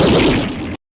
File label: bounce; cyrax; monsters; sackrax